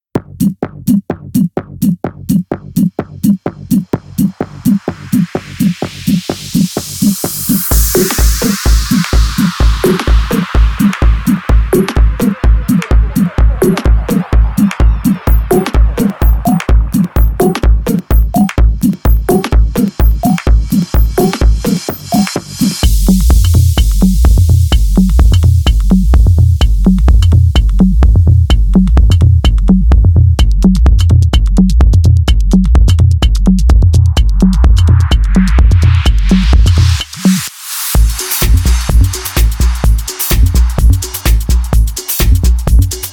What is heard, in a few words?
electric elements